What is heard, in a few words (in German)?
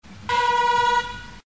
Hupe